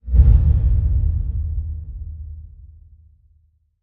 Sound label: Bass Impact Dreamy